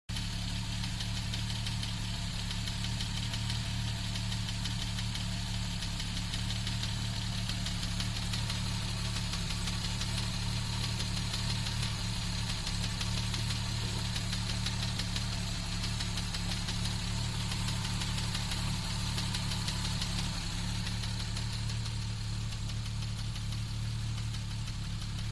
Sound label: tappet noise, jaguar mk2 engine sound; MKI MKII S-Type 240 340 Daimler